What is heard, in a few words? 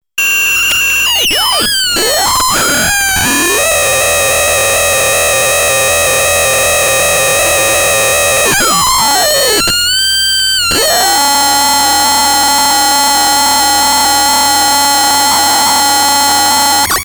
fx modem, 56k modem; Polivoks formanta